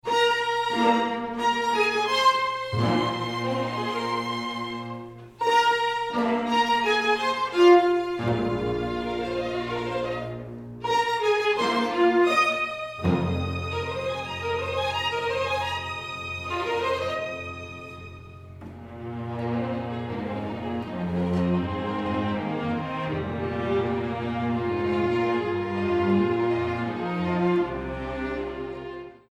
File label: Fanfare; Whitman Anniversary